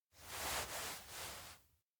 hold stomach; rikka; anemoi

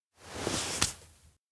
hold hand soft; anemoi

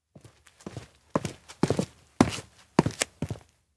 steps outdoor flea market walk; chiharu; anemoi

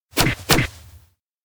kick leg; anemoi

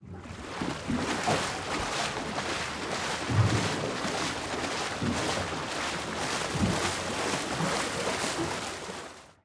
Duck boat water sound; anemoi

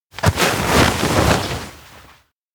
carry back snow; spica; anemoi

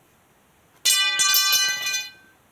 Pizza peel; anemoi

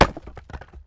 Chopping firewood; anemoi

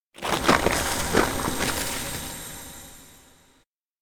sky hole expand; anemoi

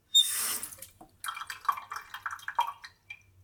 turn off the faucet; anemoi

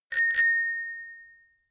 bike bell; anemoi

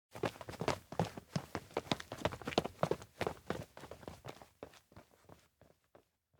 steps outdoor to plane group; anemoi

